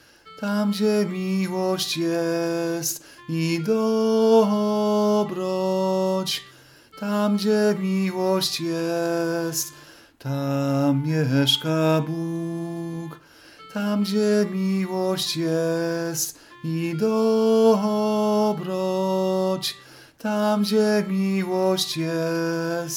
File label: sopran; Tam gdzie milosc jest; ubi caritas; Taize